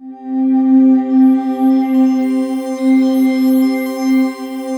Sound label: WS NL C 4, Korg Wavestation; Back In Time Records; Korg Universe VOL-1